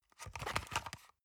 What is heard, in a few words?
paper box small handle medium